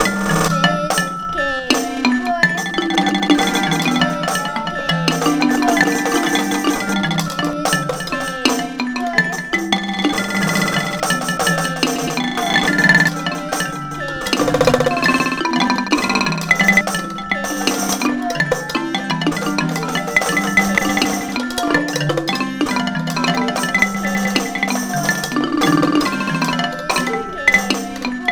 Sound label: Home Made Twangle Rock